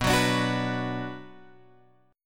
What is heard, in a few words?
B Minor B Guitar Open G